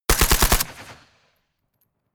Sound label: rifle ak-47 exterior fire burst close; schusswaffen; Firearms